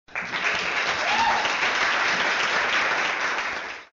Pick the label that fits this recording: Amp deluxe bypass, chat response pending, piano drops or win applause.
win applause